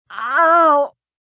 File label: Pain Ow, Pain, female, moan; VoiceBox